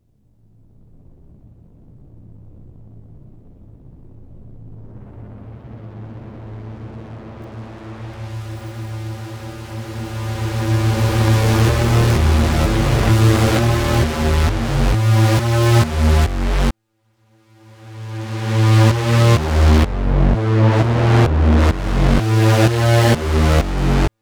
Fallist, Drum n Bass, Pads; KJ Sawka; Drum n Bass & Beyond

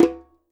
JEM F OPN, Percussion; Sampleheads; NYC Percussionworks; ST PERC VOL1

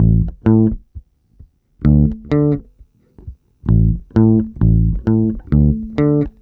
BASSE; USB Soundscan vol.32; Roots Disco; AKAI

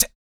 KUK, MALE PERC; Spectrasonics Vocal Planet; Jazz FX

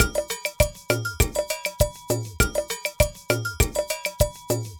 Ensemble, Latin Percussion

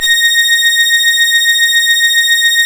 ORGAN, A#; Big Fish Audio; Synclav FM

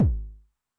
BD High Tune Long Decay, 909mod, BASS DRUM; classic machines

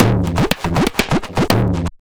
Tabla, 120 BPM; Zero-G; Pure Tabla

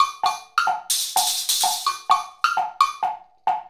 Wood Blocks, Bells, and Tambourine

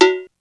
COWBELL, M1 DRUMS; East West; Global Sounds

